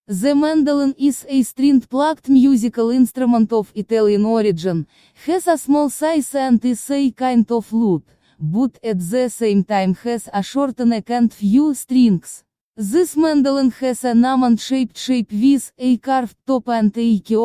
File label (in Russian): mandolina